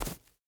boar footstep grass hard; Rust

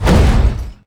impact car light

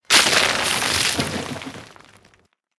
tank impact building

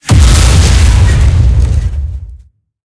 tank hit med

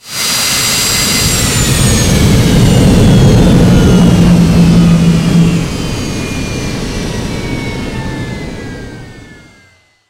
jet engine stop; War Thunder

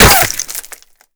impact masonry; Modern Warfare

